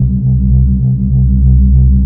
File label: reese